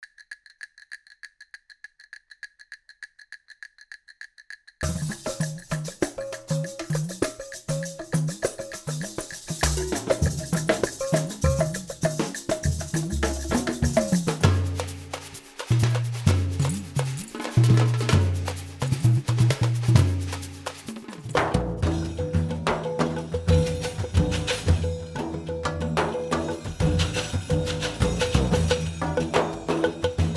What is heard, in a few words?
earth tone